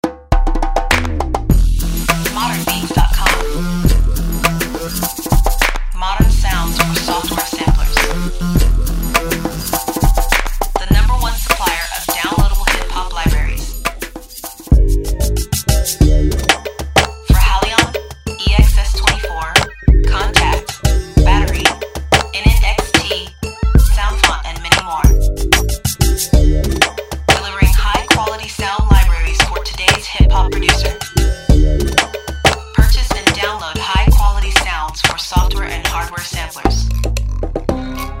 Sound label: Ethnic Percussion